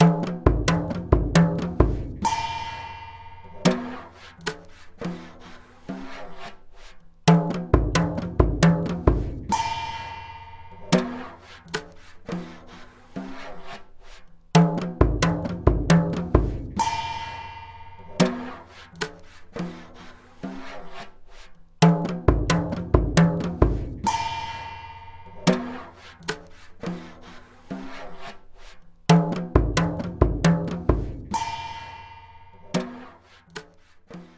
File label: MOUNTAIN POND LOOP, drum loops